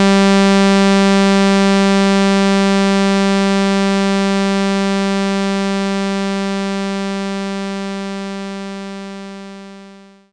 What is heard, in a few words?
sawtooth G; knights try